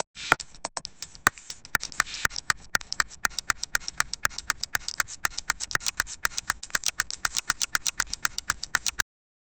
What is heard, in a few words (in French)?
bruitage clickers, zombie